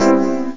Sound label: d50 seven chord; Instruments